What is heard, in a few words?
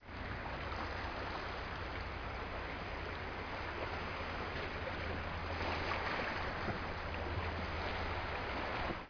sea